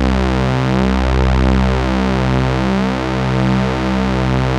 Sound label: SAW, Synth lead